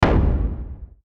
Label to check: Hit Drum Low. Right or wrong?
Right